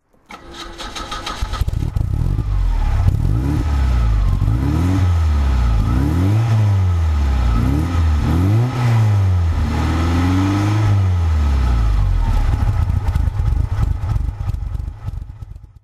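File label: alfa romeo giulia 1600 sprint throttle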